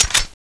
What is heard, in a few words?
clip out; tfa cso; m950 attack